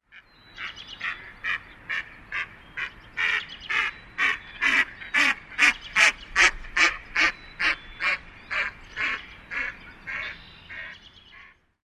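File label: female fly by, Mallard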